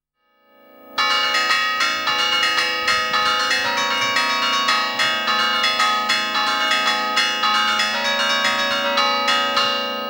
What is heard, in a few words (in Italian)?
campane